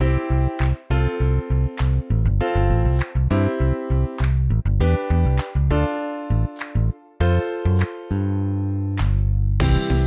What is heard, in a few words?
educational birds no melody inst 100bpm